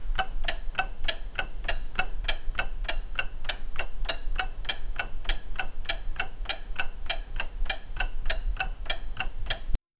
clock sound ticking; timekeeping

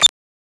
user interface

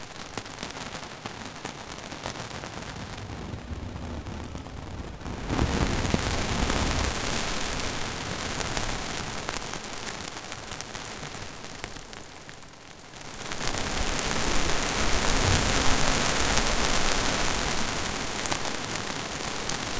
volumetric dust storm

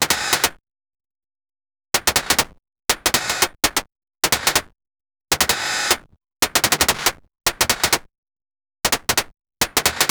game mechanical equip, glitches

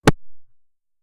the sound of a kickball

